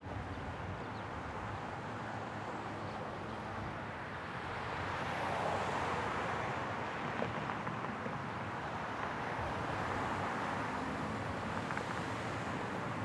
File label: Traffic Intersection; Arnold Morello